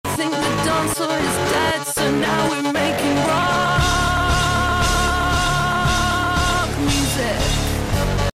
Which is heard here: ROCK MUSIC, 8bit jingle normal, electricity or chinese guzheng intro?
ROCK MUSIC